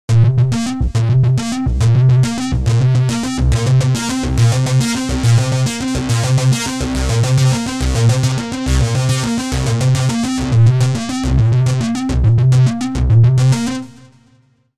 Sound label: Feedback, Korg